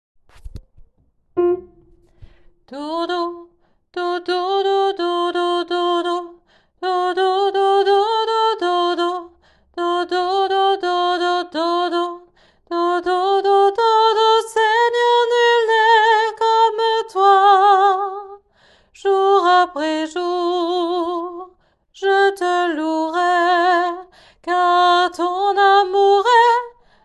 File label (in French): Oh Jesus mon Sauveur tenors